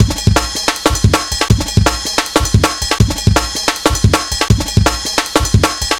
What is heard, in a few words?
160, Drum Loops, Drum & Bass; Total Drum&Bass